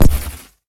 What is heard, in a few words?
elec wand